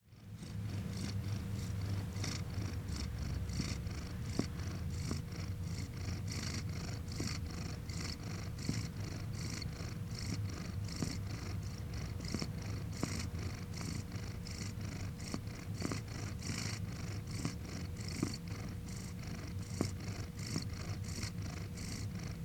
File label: cat sounds; Hayduke